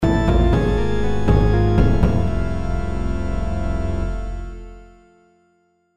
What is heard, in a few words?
MUSIC FAILED